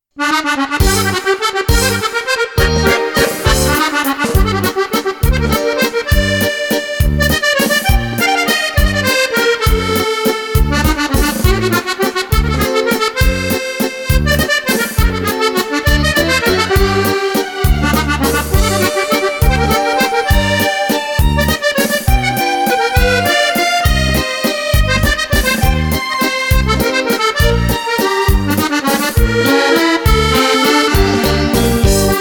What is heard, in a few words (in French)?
Musette